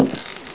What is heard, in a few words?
STEP